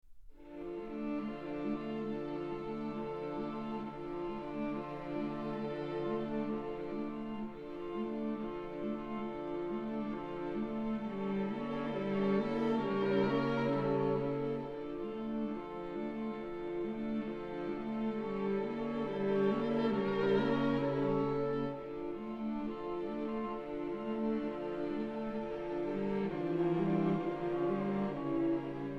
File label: String Quartet No. 3 II